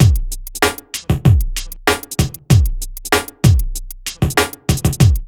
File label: beat, Hip Hop R n B; USB Soundscan vol.19; AKAI